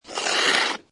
Snow And Ice Metal Slides On Ice; Weather

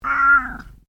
Animal Cat Tonkinese Meow; Domestic cats; Cats (Sound Effects:Animals)

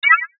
Beep Tone Signal Multimedia; Web Interactive; Beeps; Technology; Blastwave

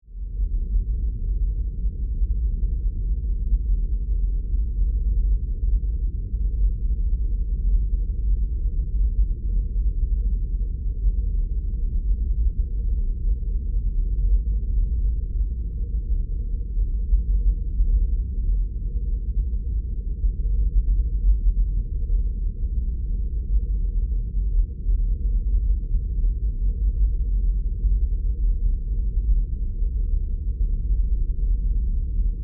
Drone Rumble Deep, Space, Scifi; Science, Fiction; Sci Fi Sound Effects; Blast Wave

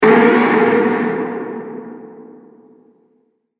Impact End It, impact / explosion sound effect; Miscellaneous Impact Sound Effects

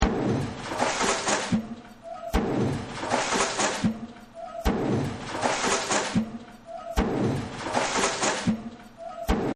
Factory; Factory 08

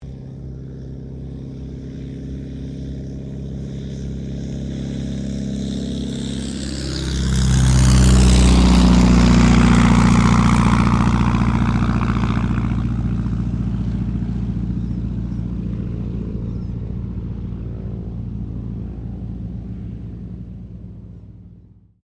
AIRCRAFT DH82A DEHAVILAND TIGERMOTH TAKEOFF; 1940s; Mixed selection of Tigermoths from the 1940s; Airplanes

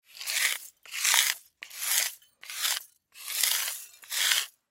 Crash Glass Debris Impact; Crash Glass Debris Sweep Up Broom; Debris (Sound Effects:Materials); Blastwave

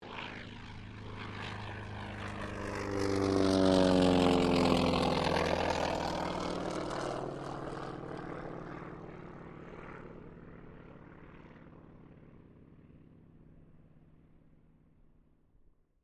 AIRCRAFT T-28D TROJAN PASS; Airplane; Airplanes; Vehicles

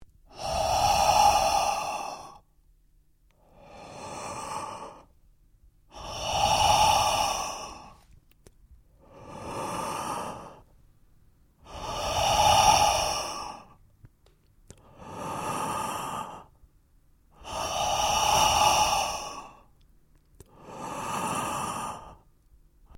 MALE HEAVY BREATHING SEQUENCE LOOP; male vocalisations; Human

